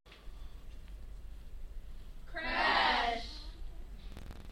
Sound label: crash; Crash sound